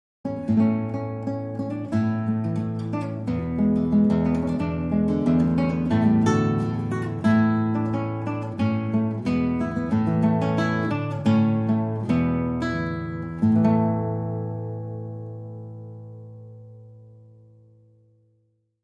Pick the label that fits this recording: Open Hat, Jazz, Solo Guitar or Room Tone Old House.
Solo Guitar